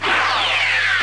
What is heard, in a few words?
phaser; hofclient